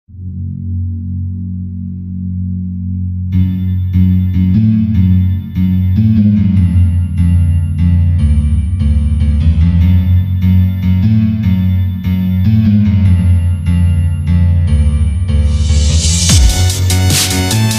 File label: Dubstep Remix